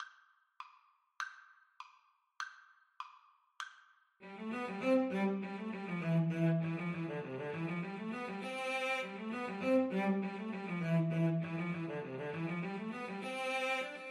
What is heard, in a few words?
Cello Duet